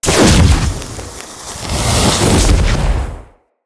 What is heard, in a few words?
Movement Burst Idle; Kuva Lich; Abilities